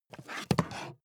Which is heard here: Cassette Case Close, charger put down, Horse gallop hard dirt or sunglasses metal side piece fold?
charger put down